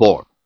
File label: Vox, Yamaha DD-20